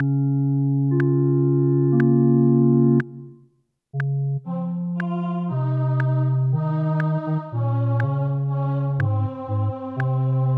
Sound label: O clap your hands; Gibbons